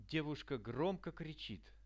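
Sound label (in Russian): devushka gromko krichit, girl loudly screams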